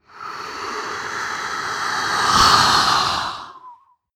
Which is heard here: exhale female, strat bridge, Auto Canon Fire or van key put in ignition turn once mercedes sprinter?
exhale female